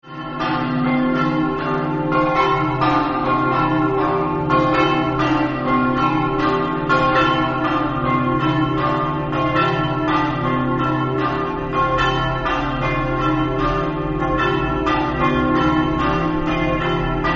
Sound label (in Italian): paderno, campane